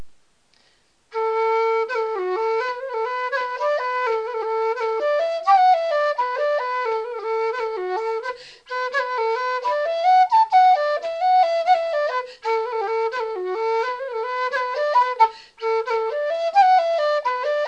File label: slow